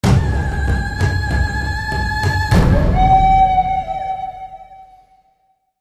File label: declare war indian